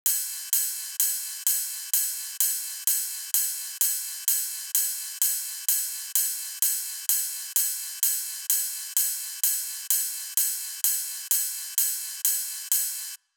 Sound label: 128 BPM Ride; Vengeance - Essential Clubsounds Vol.5; Sidewalk Kit; Root G